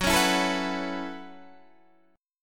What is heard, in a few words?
Gb Minor 11th Gb Guitar DADGAD